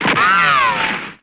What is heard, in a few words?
shot; BESTSHOT; noisybit